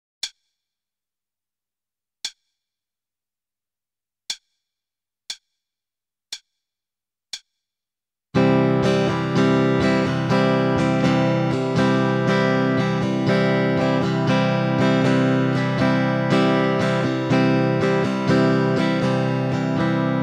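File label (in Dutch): Ritme, gitaarstarter; Meespeel CD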